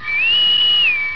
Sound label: chat